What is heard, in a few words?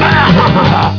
hahahuh